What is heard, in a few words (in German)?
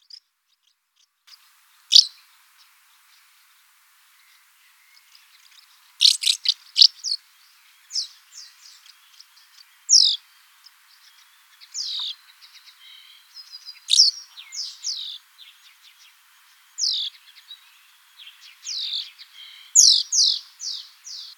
Ruf der Mehlschwalbe